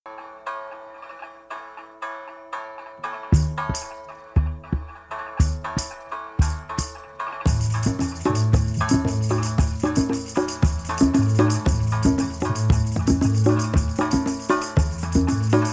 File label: drum